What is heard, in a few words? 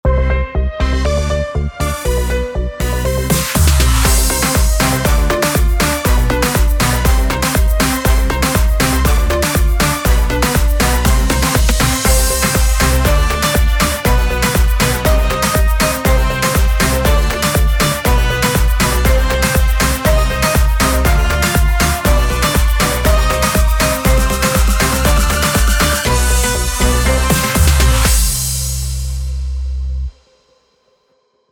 background music for short video vlog funny dance latin house